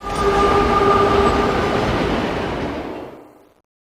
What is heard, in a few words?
train